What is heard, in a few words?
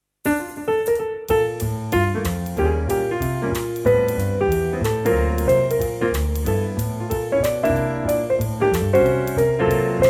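F, Backing Tracks for Male Vocals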